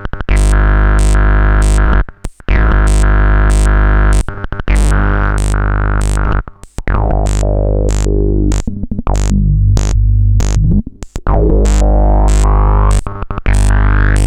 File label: Liquid Foam Loop; HerbsAndStones